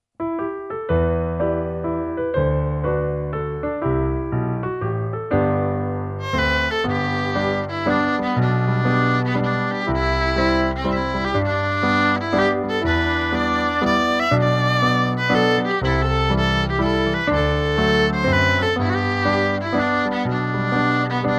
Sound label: Waltz